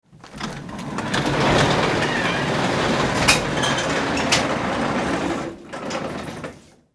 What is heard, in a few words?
Door Garage